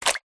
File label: horror gore heart or brain drop on ground with squelch; zapsplat